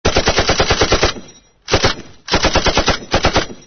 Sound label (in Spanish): Sonidos De Disparos Ametralladora